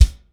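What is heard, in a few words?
NAT BD, natural bass drum; CONSTRUCT 2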